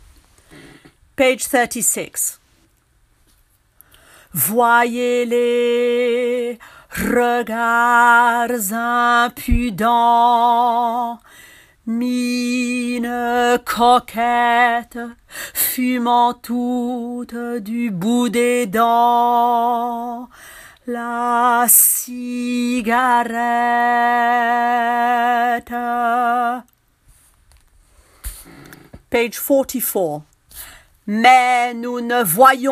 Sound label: bas; Carmen